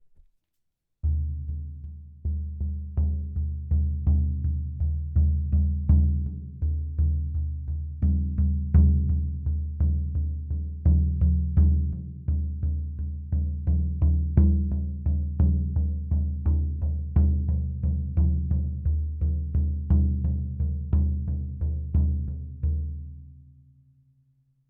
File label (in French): chevre blanc glabre